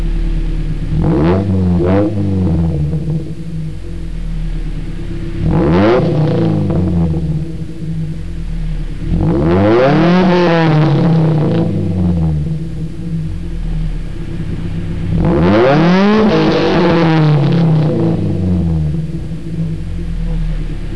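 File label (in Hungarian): ford focus, Kipuff hangok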